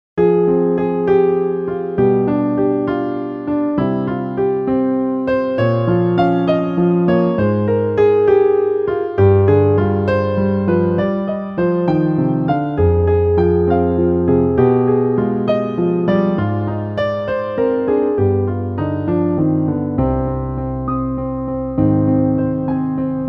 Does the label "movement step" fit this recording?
No